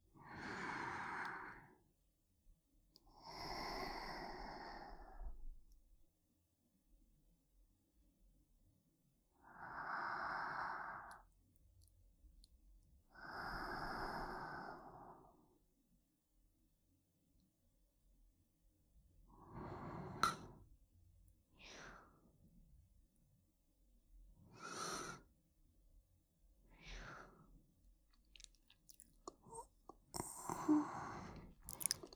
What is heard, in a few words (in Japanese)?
寝息（左）; 音圧アゲアゲver